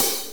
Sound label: HiHat, Blues; Sonic Foundry (Sony Creative Software); Drum Tools